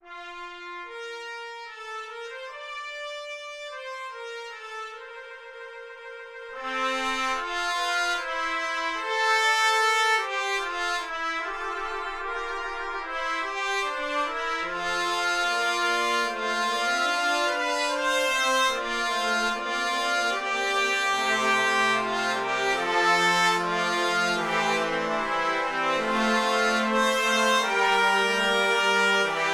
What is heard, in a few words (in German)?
Choralfuge; Ronge; di Beethoven